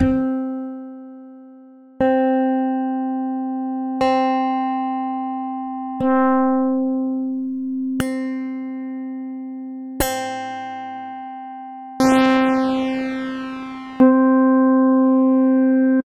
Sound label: bass